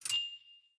Folder Close

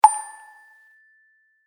Pop, phonetones notifications

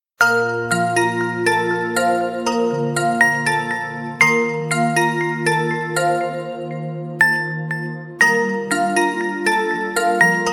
Early Morning, ringtones; WAO

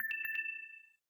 Low Battery in call

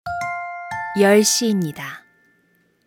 Hourly Alert

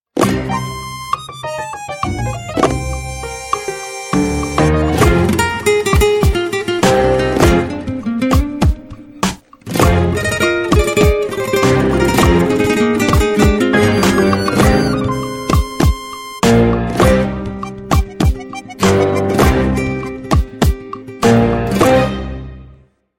Tango In Love, ringtones; Micromax; Bolt Warrior 1 Plus Q4101

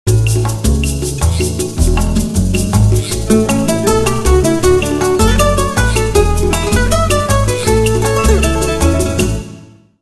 Fiesta, Nokia 9300i

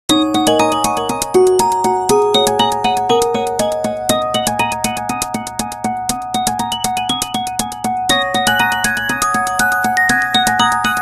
ring, stardust; HTC S740

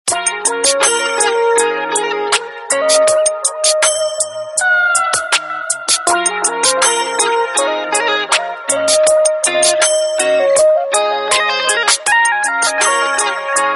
Ringtone, Samsung GT-C3510; Corby Pop Genova

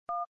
dial sound; BLU G60

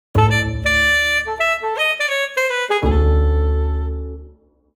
Sax

